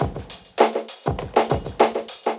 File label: drloop, drumloops, Vinyl Attack; Masterbits